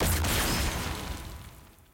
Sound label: hit; samira